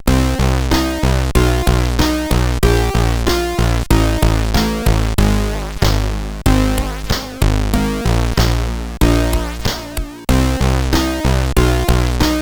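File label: Lights On, MOS CSG8580R5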